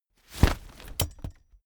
umbrella big open full hard

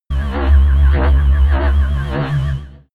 alienation

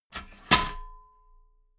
metal ladder small handle fold heavy

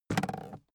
aluminium case handle bounce light

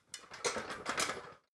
ladder metal rattling

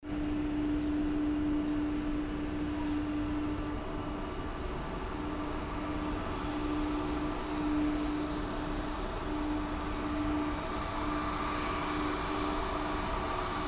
aircraft on runway

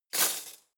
cutlery spoons drawer place hard

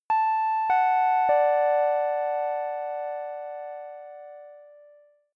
announcement keys major

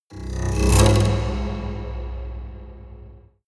haunted strings roll

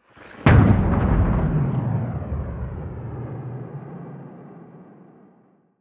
missile small fire stationary distant

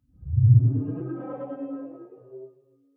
sci-fi display transmission damped

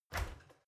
oven open squeaky

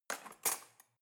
cutlery knife drawer handle rattle

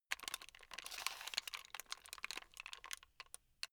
plastic rattle slow

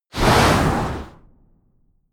wipe

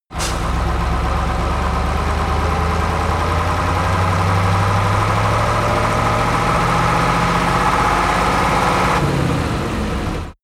truck ural 4320 acceleration

